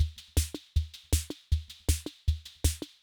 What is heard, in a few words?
Loop, rhythm king; classic machines